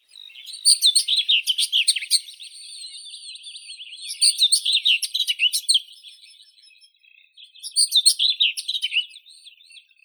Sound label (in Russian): slavka seraya sylvia communis, zvuki prirodi